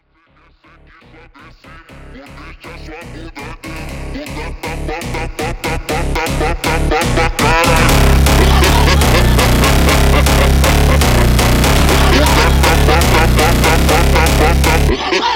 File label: Funk Slowed, Phonk